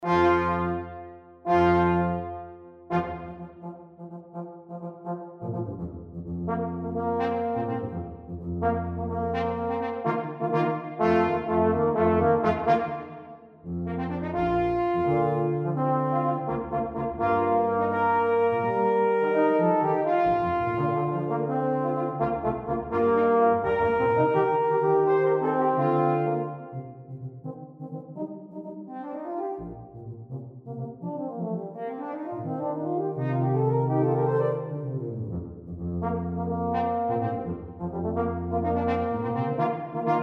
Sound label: Fanfare